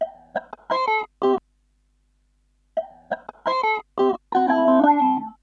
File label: GTR R; USB Soundscan vol.31; Miro s Grooves; AKAI